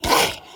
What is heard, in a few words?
zombie attack